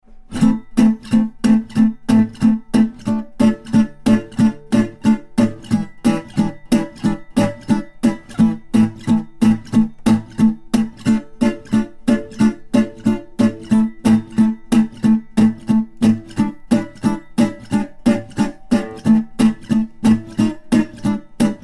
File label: accomp